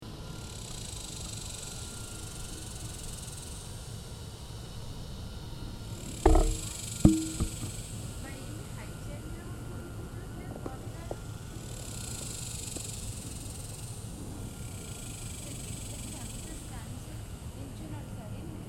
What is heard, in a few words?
Cicadas